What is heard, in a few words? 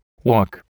walk